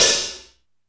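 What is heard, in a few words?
DM5 Hat Open, Alesis DM5 drum machine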